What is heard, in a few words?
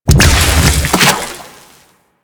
Goo Gun Sack Large Explode